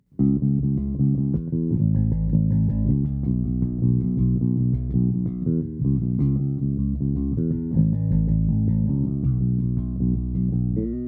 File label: Bass test comp